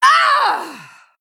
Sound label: Female Human Death